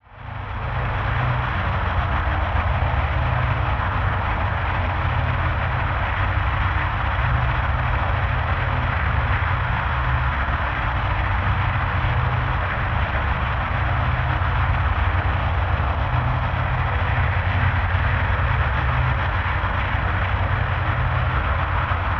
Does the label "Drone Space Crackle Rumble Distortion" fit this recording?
Yes